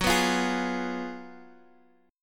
F sharp Major 7th Suspended 2nd Suspended 4th, Guitar, Standard; F#M7sus2sus4 strummed